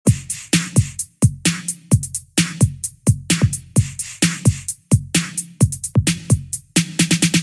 drum

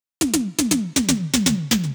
120 Drum Fill; Genesis

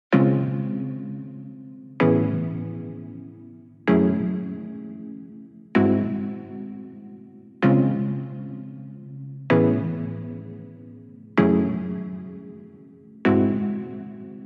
pluck synth, synth chords loop girl pluck C#min

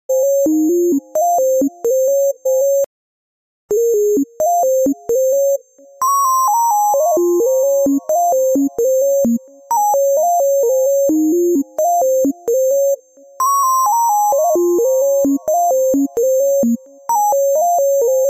synth

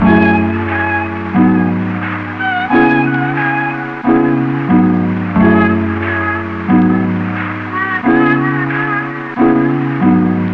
keys loop Cmin

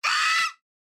scream shock

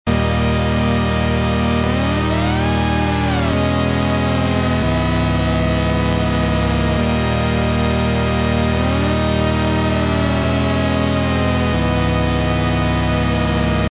uk bass

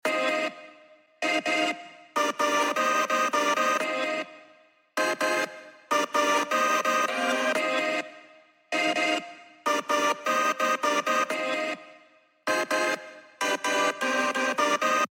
bass

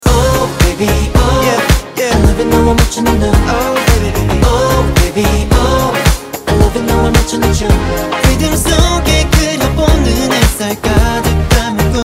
Guitar

